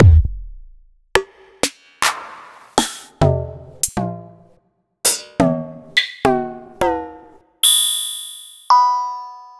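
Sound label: Roland MV8800 drum T-REX KIT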